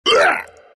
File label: pain; dota; night stalker